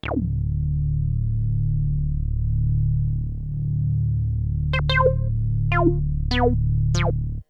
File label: D drone